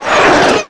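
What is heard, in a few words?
Collision Interior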